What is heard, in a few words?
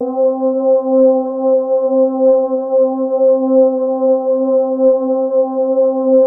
GLAMA C3, SYNTHVOICES; Music Store - Claudius Diemeter Schwerlos